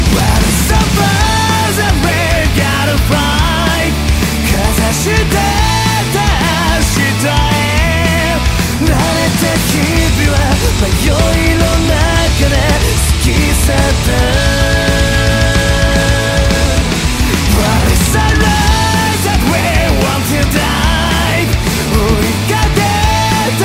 Aching Horns

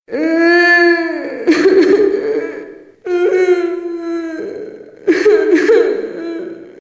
witch crying; games; ringtones

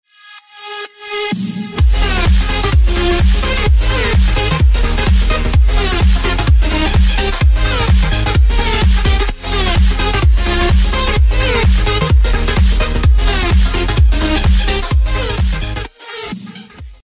Gaming Intro Music

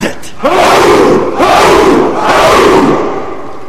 chant

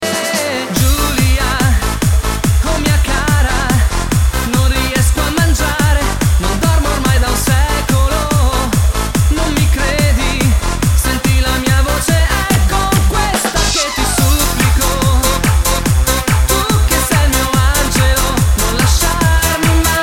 trance; quake

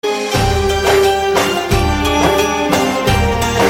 message tone

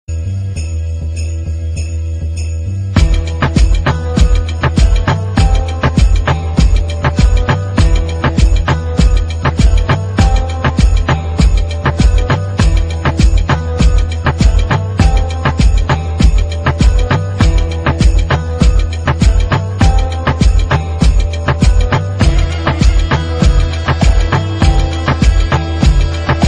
country country

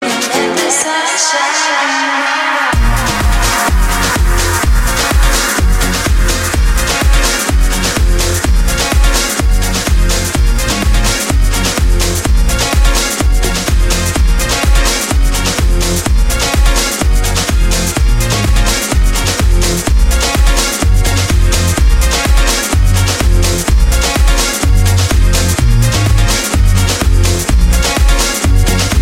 dance, electronic ringtones

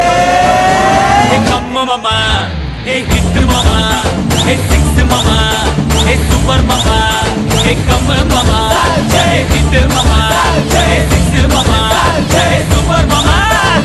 anthem, rock

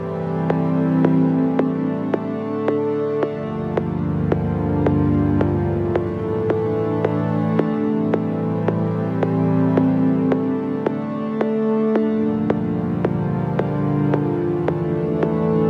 Chill ring tone

